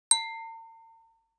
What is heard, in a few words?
Bell Ding